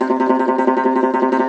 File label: cumbus saz C, plucked strings; E-MU Producer Series Vol. 7 – Old World Instruments